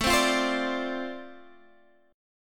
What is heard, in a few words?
D-Minor 7th A, chord